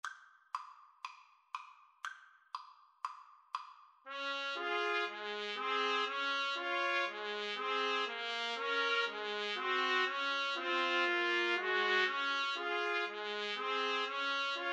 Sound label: 3TPT, Trumpet Trio